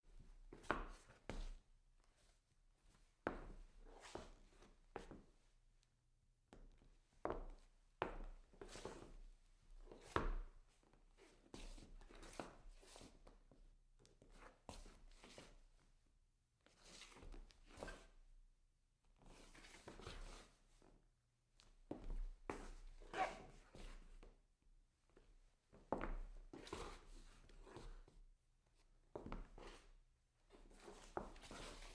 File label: scuff wood with soles